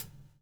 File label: BUBL, BUBBLE; Spectrasonics - Backbeat